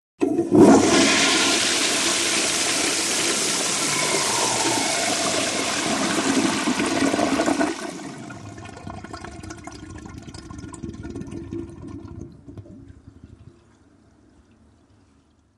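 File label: Toilet Modern Low Tank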